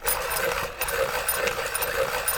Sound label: PEN SHRPNR; AKAI S-Series CD-ROM Sound Library VOL-6